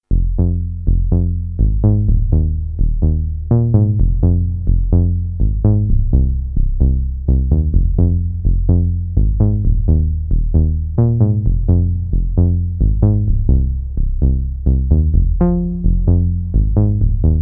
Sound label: prophet5 bass